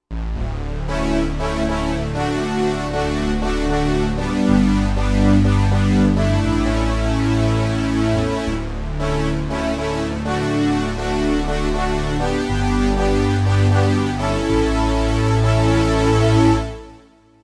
80s OBxa, vintage synth; Roland SR-JV80-04

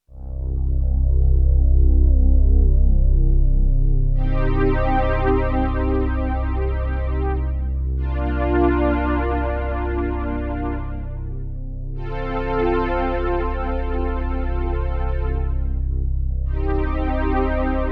Lo Strings, Roland JX-8P

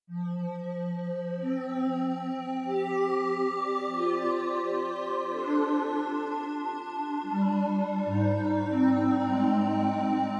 Korg Radias-R

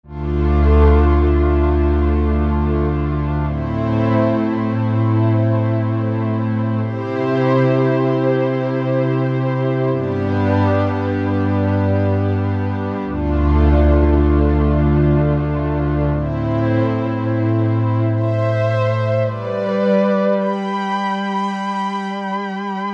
Simple Pad, Korg MSC-12S Synth; Synth 3

